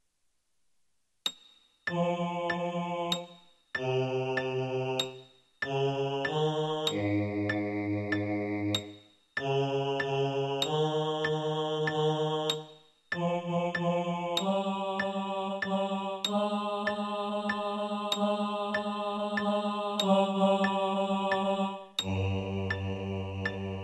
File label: bass, choir